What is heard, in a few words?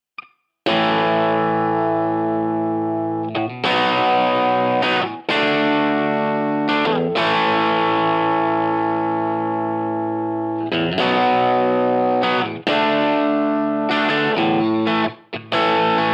Dirty Bridge - Marshall Plexi, pickups; three Fedora pickups (6K each)